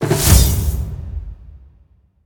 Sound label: fire; smite; god raijin